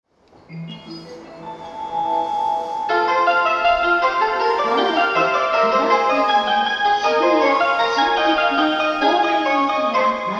gotanda melody, Tokyo Train Tunes; japan

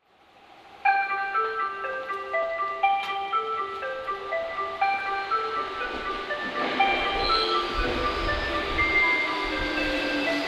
mejiro melody, Tokyo Train Tunes; japan

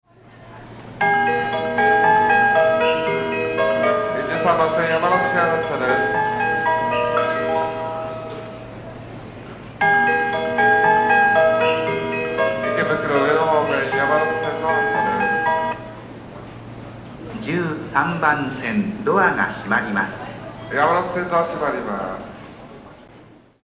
shinjuku melody, Tokyo Train Tunes; japan

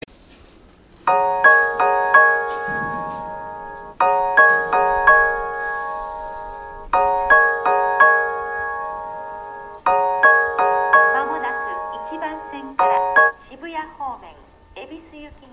shinjuku melody, Tokyo Train Tunes; japan

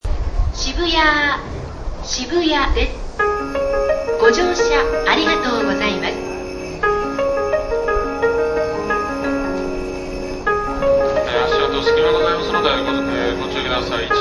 shibuya melody, Tokyo Train Tunes; japan

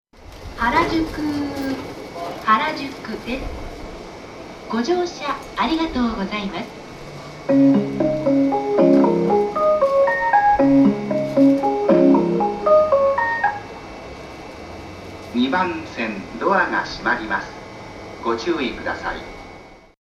harajuku melody, Tokyo Train Tunes; japan

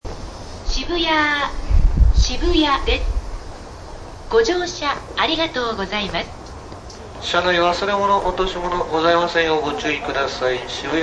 shibuya melody, Tokyo Train Tunes; japan

